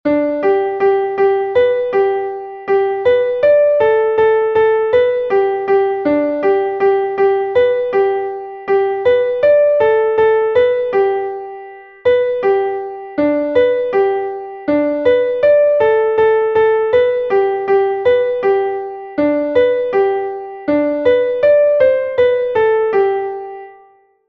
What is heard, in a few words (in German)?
klavier; ich hol mir eine leiter; melodiemeister